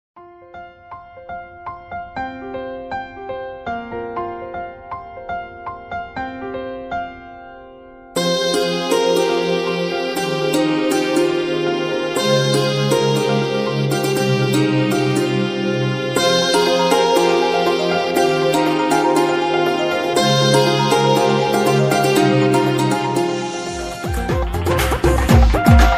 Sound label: marriage ringtone christian marriage music; jesus ringtones